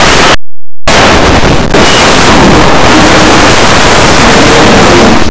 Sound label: safe; Blue Heat